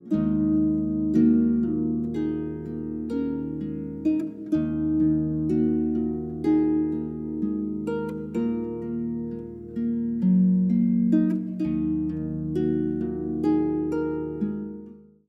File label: Lullaby